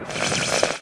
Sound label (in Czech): svist